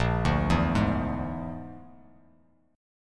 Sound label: Bb 7th Flat 5th, Bass Standard chord; Bb7b5; arpeggiated; JGuitar